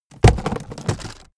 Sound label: aluminium case hit